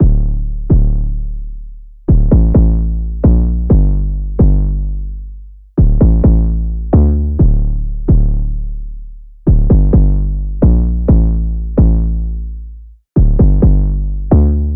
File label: 808 loop 130 Dm; Slate Digital BASSHOLE Sample Pack; 808 Loops